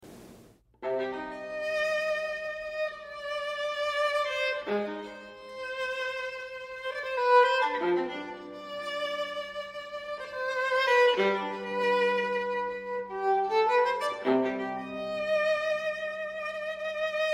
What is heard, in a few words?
viola